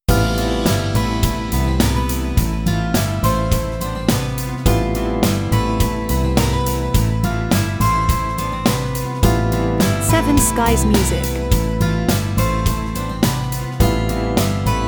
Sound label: Parisian Gipsy jingle